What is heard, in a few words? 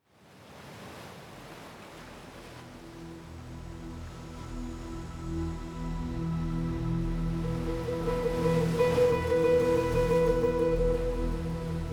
Soft Sleep Music Asmr Waves